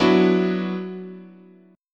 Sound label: F sharp 7th Suspended 4th Sharp 5th, chord